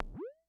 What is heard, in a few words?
Multi, Vermona DRM1-MK2